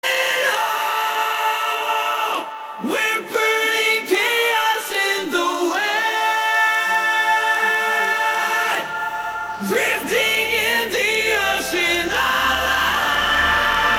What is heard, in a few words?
Acapella